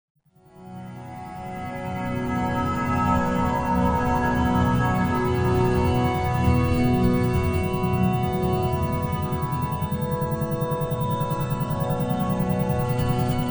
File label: Intense Mental Workout Isochronic Tones Brainwave Entrainment